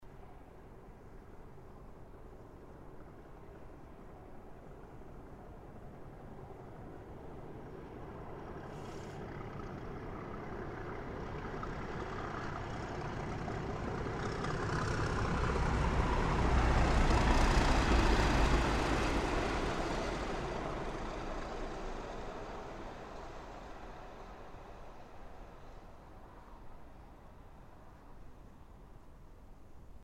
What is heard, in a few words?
Raw Trains